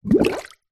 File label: nature water bubbles blown into basin very light room reverb